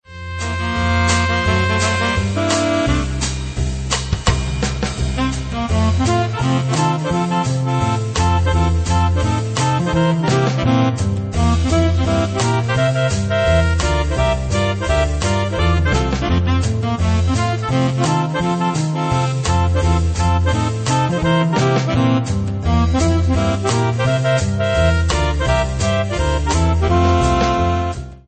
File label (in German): Swinging Saxes zickig